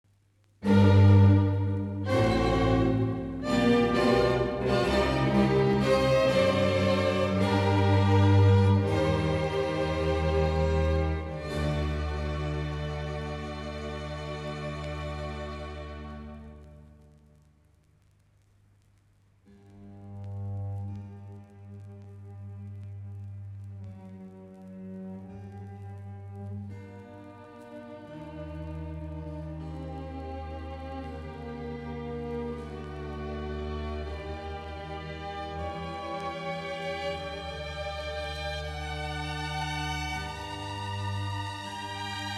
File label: Concerto grosso g-moll; A. Corelli